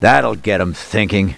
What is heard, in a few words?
LAUGH; BattleSNDS